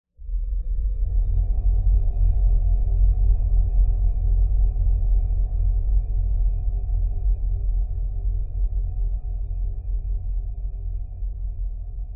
science fiction dark drone empty desolate lfe fade